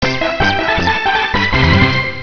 Jingle Quiz Start; Gotouchi Kentei DS

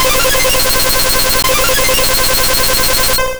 Sky Attack Hit

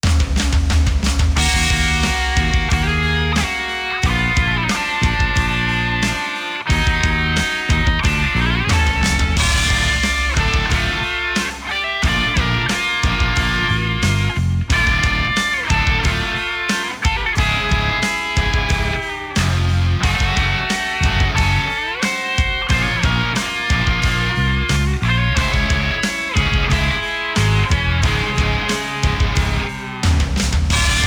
Match Loss; mario strikers football battle league; Against - Yoshi

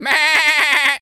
goat baa stressed hurt

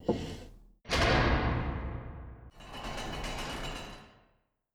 Cellar Door; Lucius III

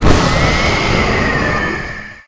Dark Magic Demon Appear; Lucius III

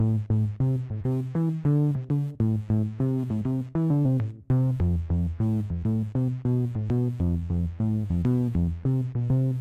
Bass Soft; Remember Me; QA Sound Prom

